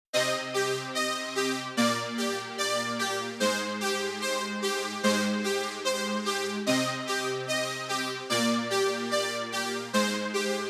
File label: beat